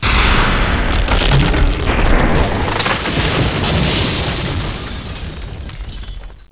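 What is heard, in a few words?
CRUMBLE; THEXDER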